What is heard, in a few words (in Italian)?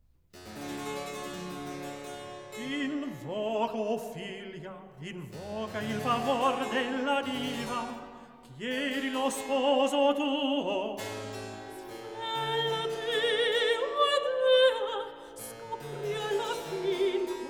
Recitativo; KV 111 Ascanio in Alba 1771; Aceste, Silvia, Ascano, Venere; Invoca, o figlia; Mozart; Operas